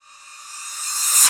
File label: rev Splash, drum machines